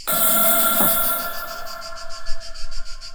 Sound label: Metal